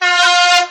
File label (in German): D Horn mittel